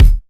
dry kickdrum d key, kickdrum sample tuned to the D note. Loudest frequency: 244Hz